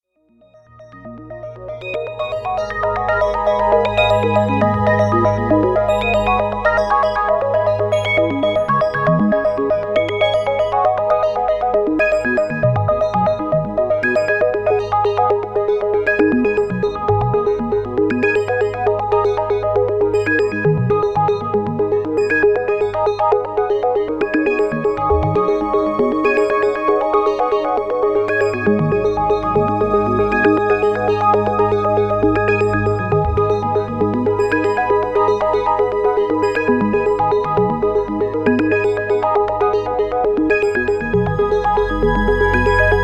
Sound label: synthex mix